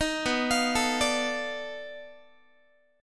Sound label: B 7th Sharp 9th Flat 5th, down, Guitar; B7#9b5 arpeggiated; JGuitar